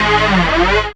synth g#, Rave Synths; Dance Synths

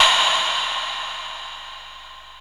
FX; AMG Megabass REMIX! VOL-2

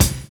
SERIAL BD; Univers Sons

